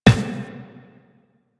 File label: Run Glass, Footsteps; Warframe; Tenno